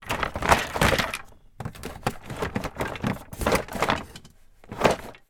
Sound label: toys pile search through